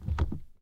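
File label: gear shift; DanisRace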